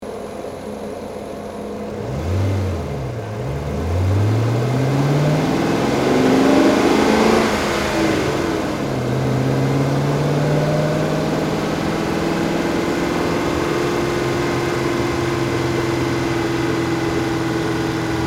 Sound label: underbonnet test